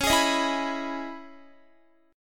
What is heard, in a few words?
C sharp Minor 9th C sharp Guitar; C#m9; strummed